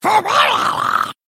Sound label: male murloc play